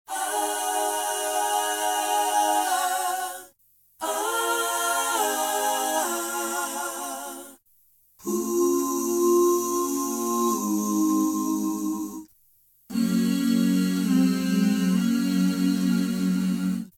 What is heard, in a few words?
Ethereal Voices DRY; RaySpace